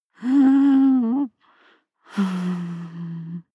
breath female whimper breath

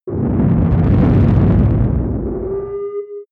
spaceship flyby load warp

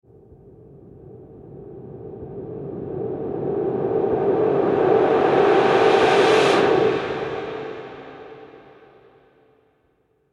noisy delay riser

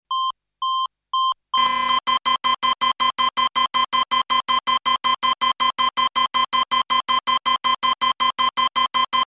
ekg control beeps and alarm

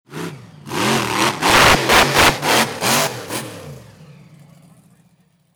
monster truck race

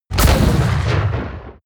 ballista fire

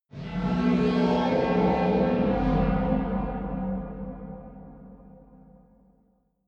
tunnel horror bass

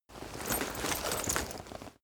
leather metal handling short

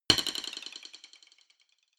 drumstick hit move hard

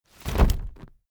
umbrella telescopic put up fast

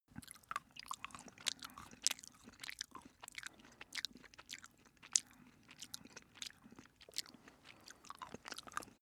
cat eat sequence

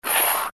scratch

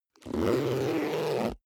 zipper backpack close

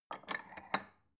bowl take soft

